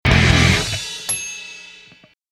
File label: Grunge Power Chord